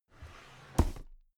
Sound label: drawer cabinet carton open heavy